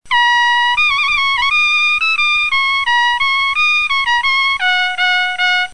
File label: Fast trombone; brass